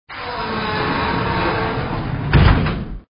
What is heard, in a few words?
Door Creak